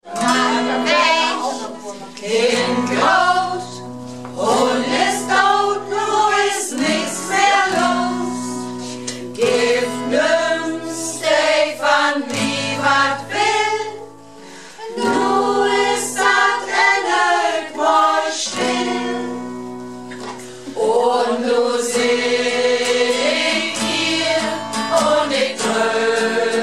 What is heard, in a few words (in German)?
Nugat Gitarre